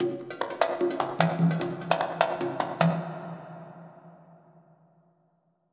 Musical accent tribal ethnic hand drums reverb fill; SFXBible